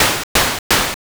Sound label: swim